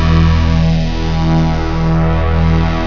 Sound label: carrier